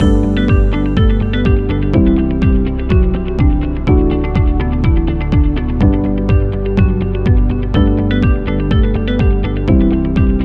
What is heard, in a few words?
Happy Loop; conference